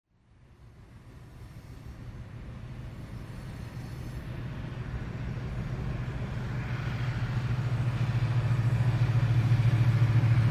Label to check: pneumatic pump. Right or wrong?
Wrong